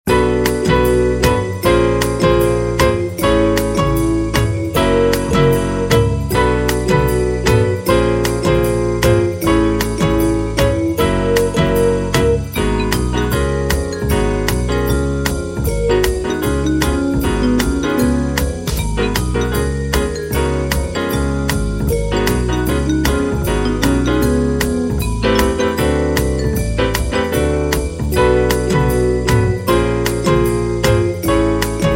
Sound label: Hardbop